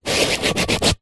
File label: sport soccer ball flat scrape concrete; zapsplat